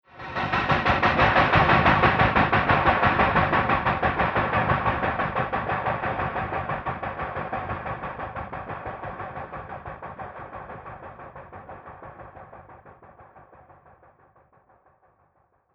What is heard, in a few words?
Horror On The Devil Train, scary soundscape; Horror Sound Effect